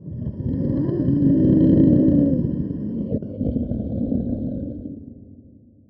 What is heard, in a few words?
Dungeon breath; 100rogues